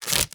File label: tape impact bullet, plastic; physics